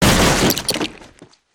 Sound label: hazmat smashable, destructibles